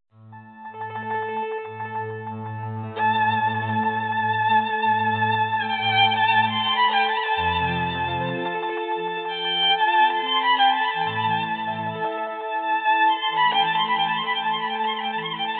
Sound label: Violin